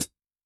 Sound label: Pedal, HiHat; Yamaha DTXpress-II